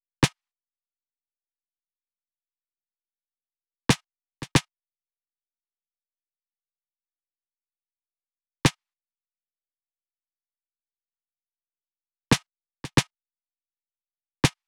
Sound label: Drum Loop - 114 BPM Snare; Loop Stems; Cymatics; Vibes II Drum Loop Collection; Toast